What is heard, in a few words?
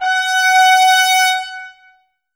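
trumpets F#, brass; 90 s Sample CDs; Miroslav Vitous; Woodwind Brass Ensemble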